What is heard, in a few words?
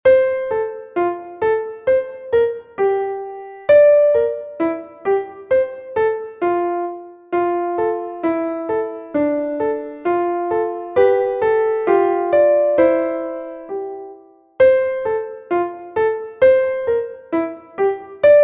Scherzando; Dances Songs No 1